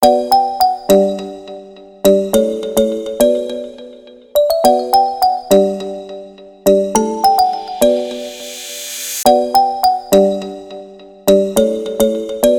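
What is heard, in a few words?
krasivyj zvonok na telefon, beautiful phone ringtone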